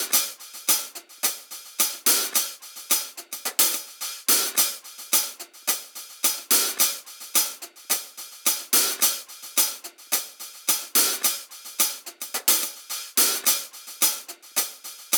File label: XLN Audio Life Waltz